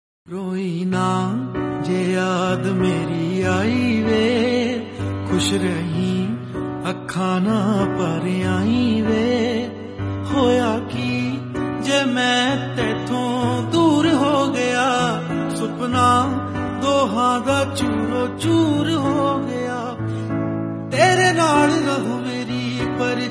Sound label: in punjabi